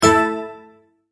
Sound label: multimedia tone exclamation; McKinney Sound